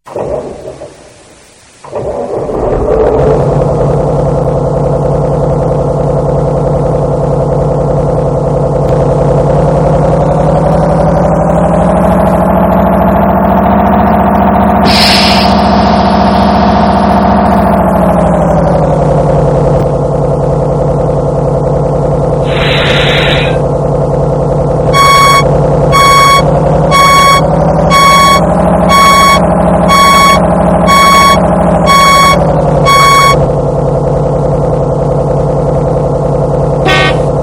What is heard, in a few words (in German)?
Bagger Liebherr, modellbau; Soundbeispiele